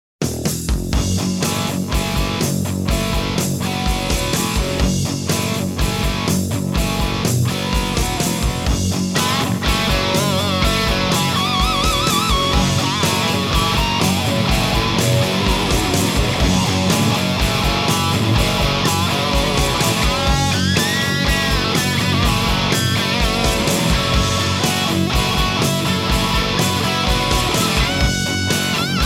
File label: solo